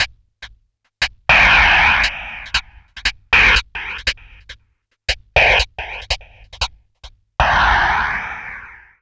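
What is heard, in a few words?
MROOM hi-hat, drum loop; Big Fish Audio; Uncivilised G'rooves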